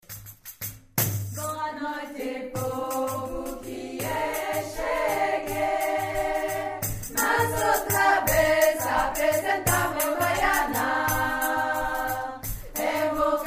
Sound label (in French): Baiana chant et medium